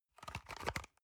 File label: paper box small handle soft